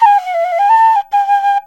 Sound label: SERULING, Heart of Asia